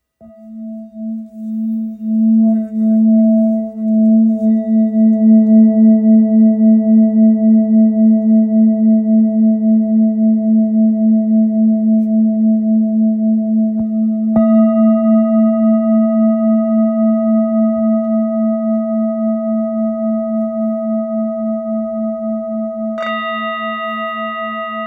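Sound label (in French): Bol full moon la, bols chantants tibétains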